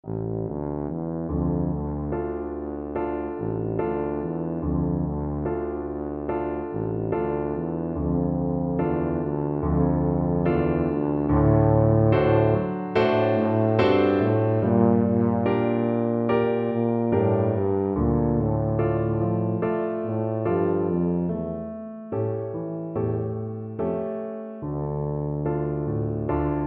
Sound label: bella ciao, tuba